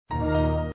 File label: lock; E398 phone